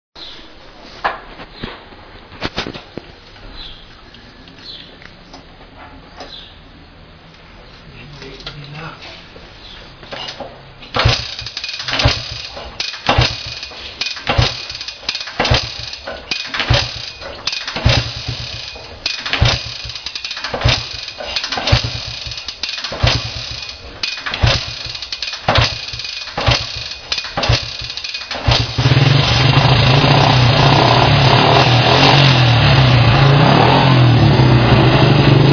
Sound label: motorbike, gilera giubileo 1960